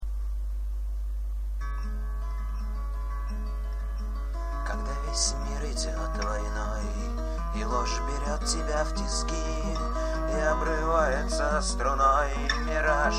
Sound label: Steel